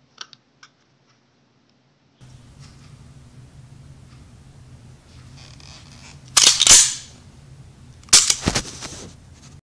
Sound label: Filling magazine; پر کردن خشاب